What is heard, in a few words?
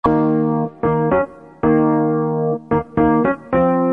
melody